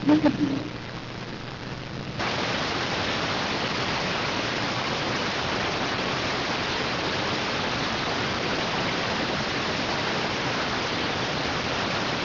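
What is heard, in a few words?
radioteletype receive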